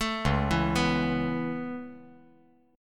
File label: Db Minor 7th Sharp 5th Db down, chord; arpeggiated; JGuitar